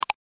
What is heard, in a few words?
cancel